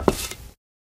black tile, footsteps

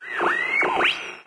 the golden era question, windows 9x sound schemes

